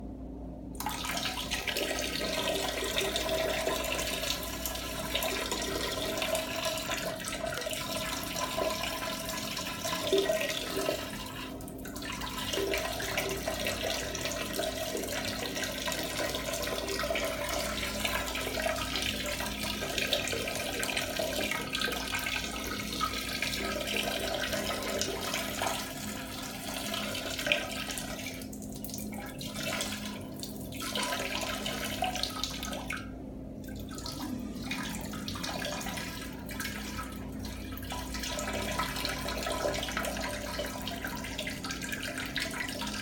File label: Long Piss